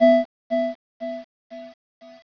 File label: Better Bleeps